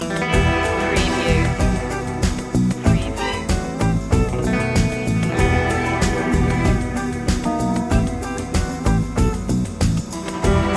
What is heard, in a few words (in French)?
amour et plage loop